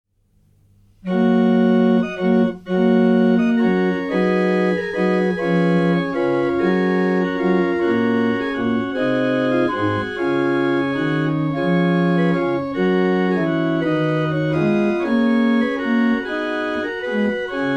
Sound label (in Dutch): Holfluit met Prestant